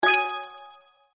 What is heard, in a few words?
Bonus Click; Chinese New Year Deluxe